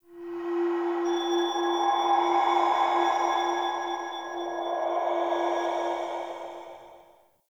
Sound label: Cave Shrine Spirit Bells, ambience; Midrath Island